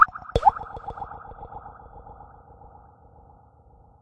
Drop; And Yet It Moves

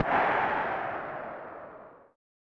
Wild Crowd

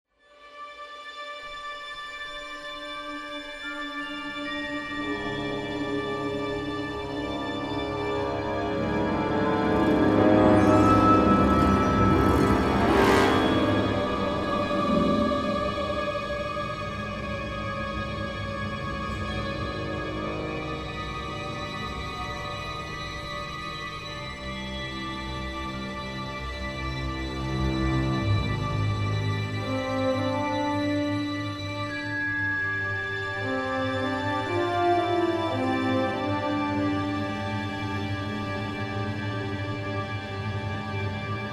Strategy Ambience; Master of Orion

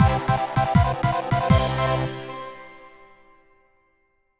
Victory; Cat-A-Splash

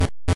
Climb; Pizza Tower early build test ost

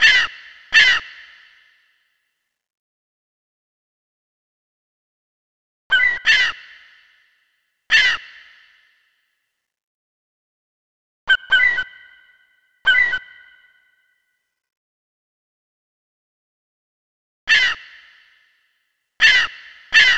Seagulls Ambience; Spyro Attack of the Rhynocs; gba

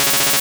Scary Face; Pokemon; Gen 2; attack moves; GSC

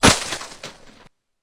snowball hit

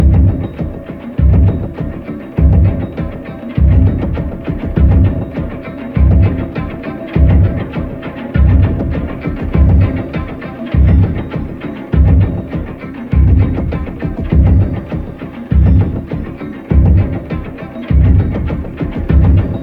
Cockup Loop

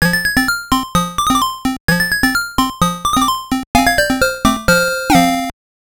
Lose; Pac-Man; Game Gear

